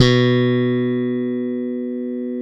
POP BASS, Electric Basses; PlatinumPhattCD2; E-MU Producer Series Vol. 8 – Platinum Phatt

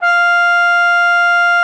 F5, TRUMPET; Xsample VOL-3

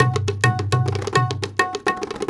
India, Heart of Asia

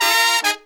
Pop & Funk Brass

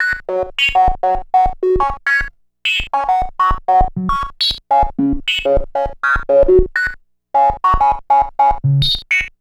Keyboard; Sonic Foundry (Sony Creative Software); Infinite Soul VOL-2; Keys

